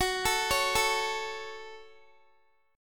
F sharp Minor, Guitar; F#m arpeggiated; x,21,19,18,21,x; 4E,4A,5D,5G,5C,6F; chord shape

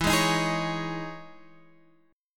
E-Major 13th, chord; EM13 strummed; x,7,6,8,9,9; JGuitar

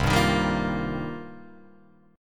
C Minor 11th, C, down, Guitar, Open F; strummed; JGuitar

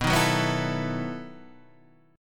E Major 7th Suspended 2nd Suspended 4th

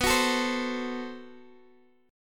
A-Minor 6th B, chord; Am6/B; strummed

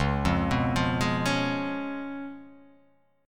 C sharp 7th, C sharp, down

